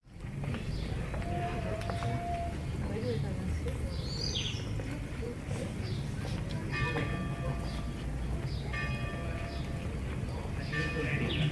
Running Dog Footsteps

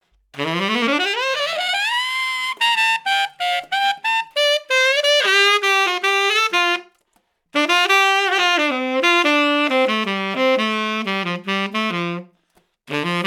90bpm Up And Up More

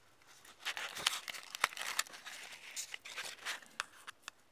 Fumbling With Matches

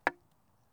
Single Rock Hitting Wood

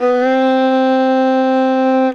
Violin Bit

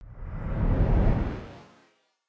Windy Whoosh

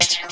Electric Future Blast

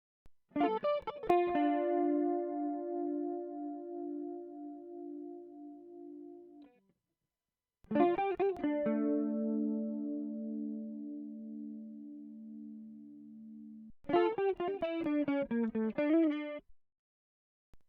Bachata Guitar

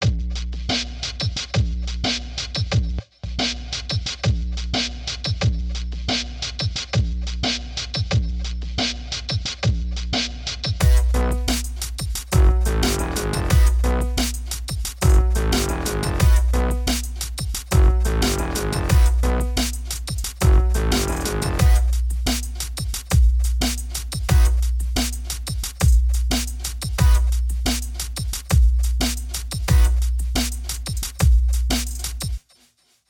Funky Trap

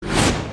Whoosh Short

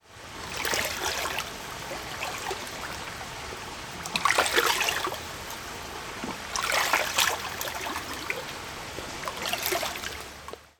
Move Water

